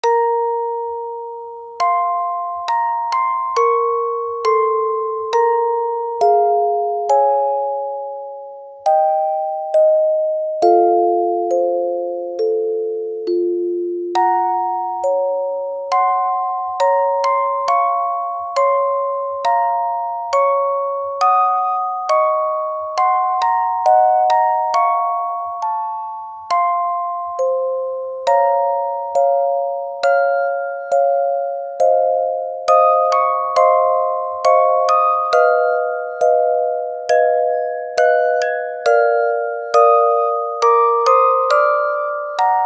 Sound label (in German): orgel